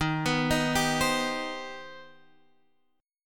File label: C Minor Major 7th D sharp